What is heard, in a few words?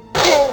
raptor idle, raptor